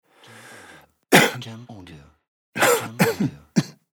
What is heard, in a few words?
Cough Man